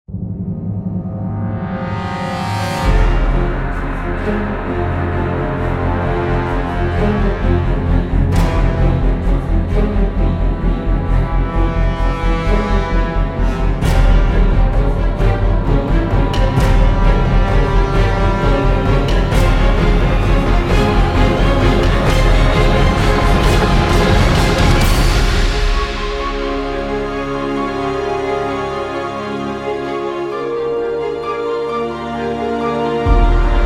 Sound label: Cinematic Trailer